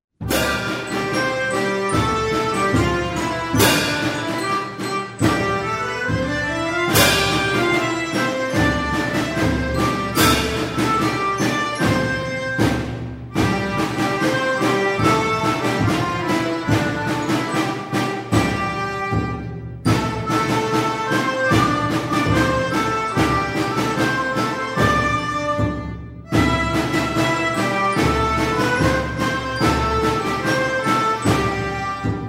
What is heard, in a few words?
school song music